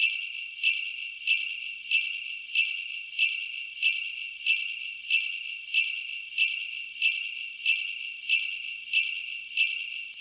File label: Bells